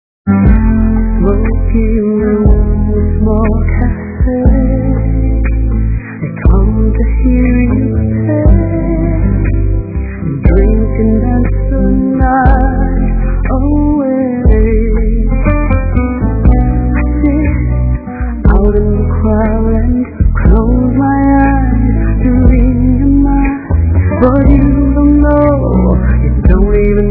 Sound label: Spanish Guitar; duetos